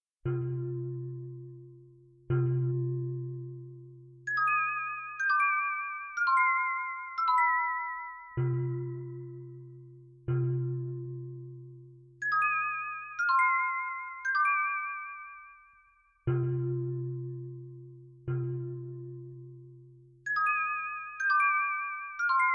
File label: Bells